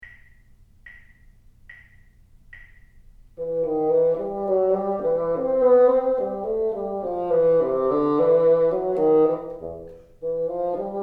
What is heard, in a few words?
teacher, bassoon